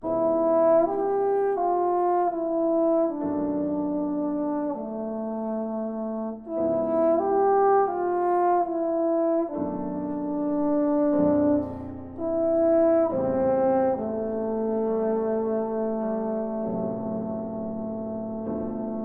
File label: Sonata for Trombone and Piano, Adagio cantabile